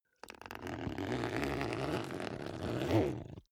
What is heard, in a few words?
zipper backpack open slow